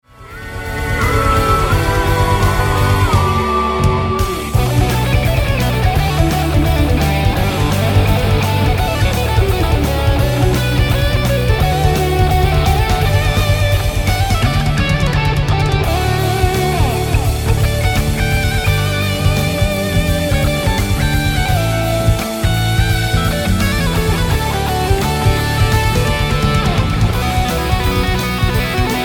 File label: solo